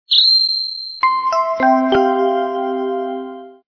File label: position win